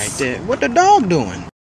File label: game over; mario